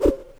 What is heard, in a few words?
Woosh; el chavo una feria en la vecindad; ios mobile gamerip 2012